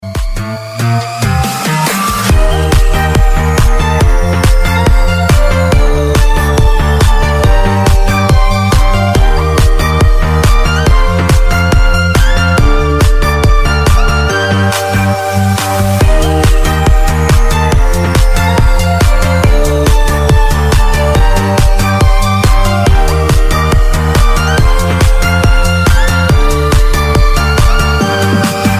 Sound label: Beat Remix; nhac chuong